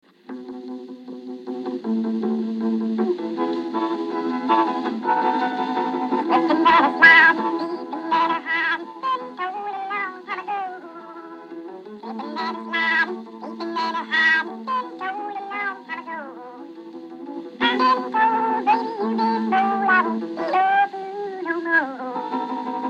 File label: Slipping And Sliding (half-speed)